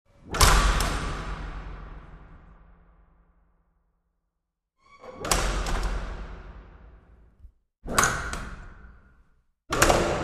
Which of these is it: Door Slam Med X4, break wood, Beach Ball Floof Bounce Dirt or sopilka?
Door Slam Med X4